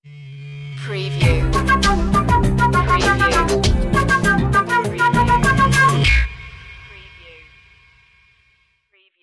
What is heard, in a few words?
electric bazaar sting